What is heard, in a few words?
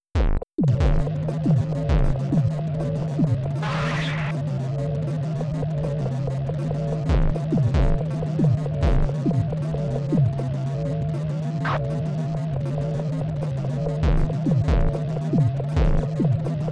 Noise; Mario Artist; Polygon Studio; N64